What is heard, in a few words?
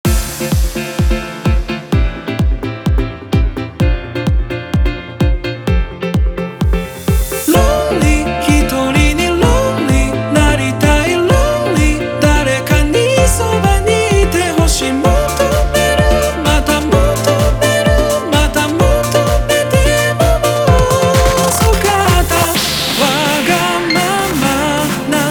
Piano House ver.